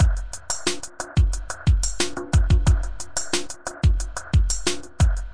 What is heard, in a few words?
90 bpm, chill loop, drum loops and breaks